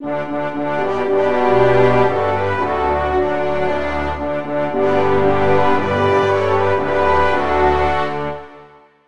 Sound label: Anthem Czech Republic; Buzz! The Mega Quiz; PS2